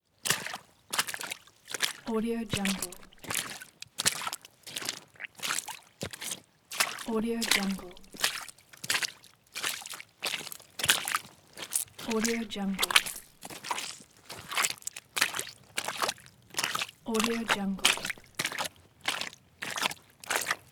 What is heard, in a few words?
walking on watery path